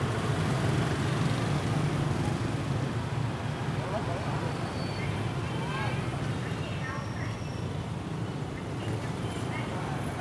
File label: saigon busy traffic ambiance loop